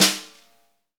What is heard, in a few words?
snare, Roland; Club-50 - Foundations Roland; Ext. Snare 4